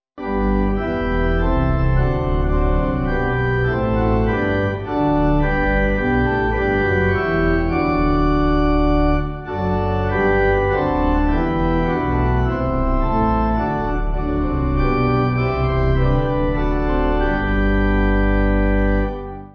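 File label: Pipe Organ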